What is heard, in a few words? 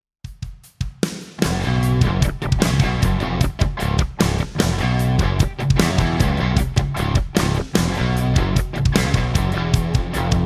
funky